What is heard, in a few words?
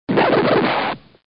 Scratch; The Fancy Pants Adventure World 2 online gamerip 2008